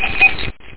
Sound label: key